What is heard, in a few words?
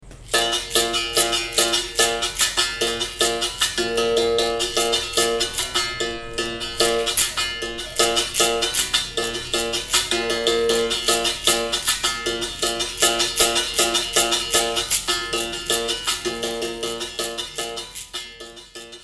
Berimbau